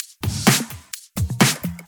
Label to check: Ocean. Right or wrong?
Wrong